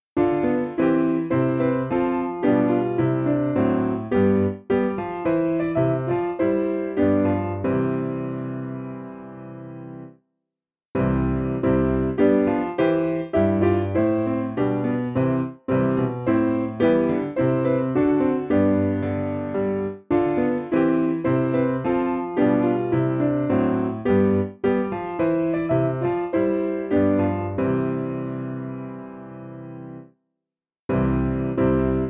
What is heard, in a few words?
piano, hymn